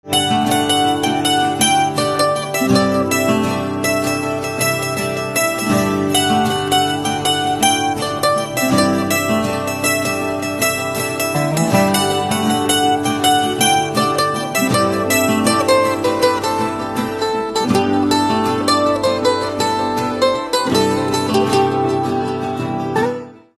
Sitar; hindi instrumental ringtone

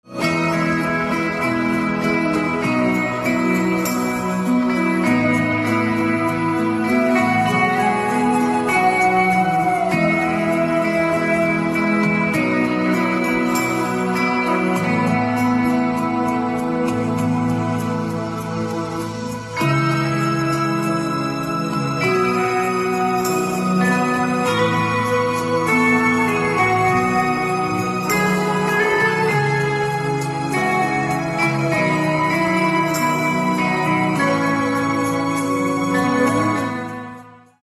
Guitar Instrumental Ringtone; english instrumental ringtone

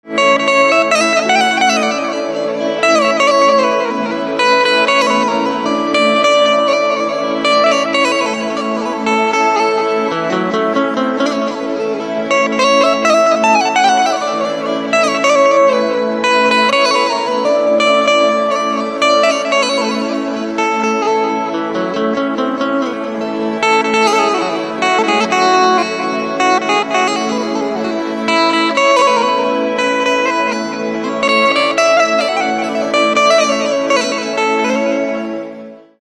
Banjo

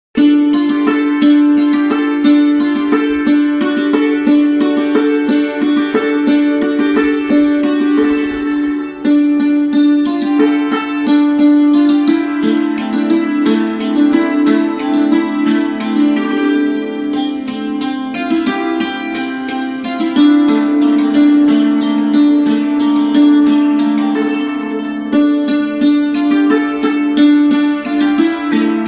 Santoor Instrumental Ringtone

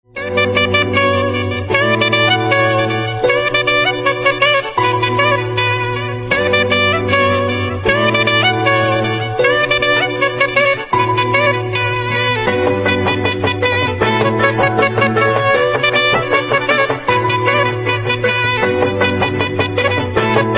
Instrumental Ringtone

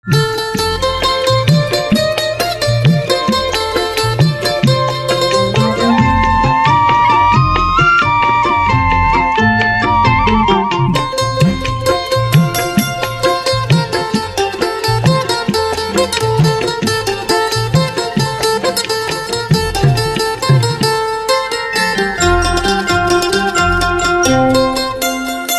Sitar Instrumental Ringtone

